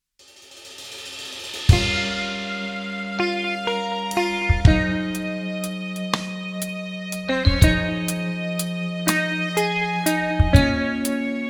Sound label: Rain Drop